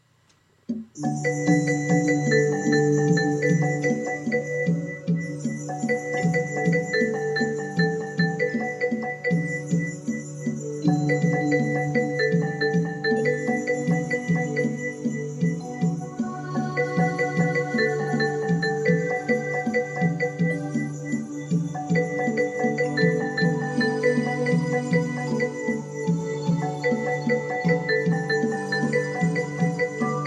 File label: tribal